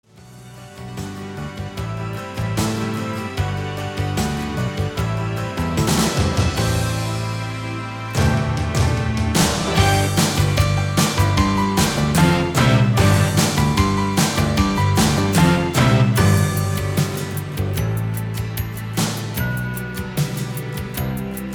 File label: A New World Instrumental